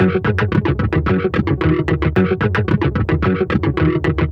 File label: Acid Squelch, Bass; Sonic Foundry (Sony Creative Software); Synchro Funk